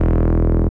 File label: Bass E2; 音MAD